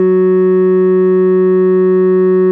F#2 CS1X TRI; AKAI S-Series CD-ROM Sound Library VOL-7

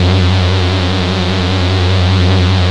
PULSE NOISE F#2; Best Service Alpha Dance vol.2

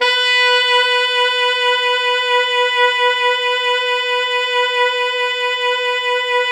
Pop Brass, B3 -R; Best Service ProSamples vol.19; AKAI

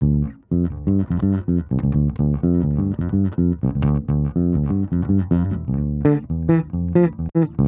Bass, 125bpm Dm, Nu Disco; Zero-G; Construction Kits; Speedy

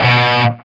C 4 SOLDANO, Guitars, ROCK; Spectrasonics; Hans Zimmer Guitars VOL-1

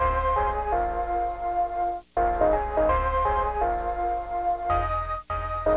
ORG, Hip Hop and R&B Drumloops; Best Service; ProSamples vol.01; AKAI

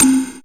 synth, c, Top End Tinklers

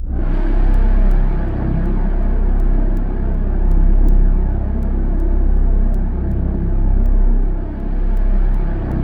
C1, Synth Leads

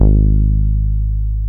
BAS MKS-50; Best Service Gigapack 2

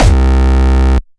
Distortion Kicks, Bassdrums

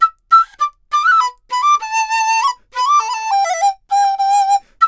DIZU, 100; INIS - Opium; DIZU 100-G

